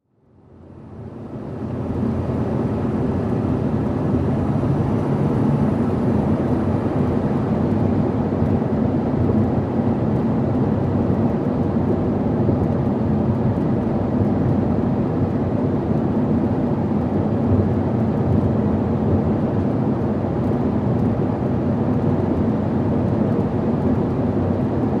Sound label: SUV At 55 65 Mph Stop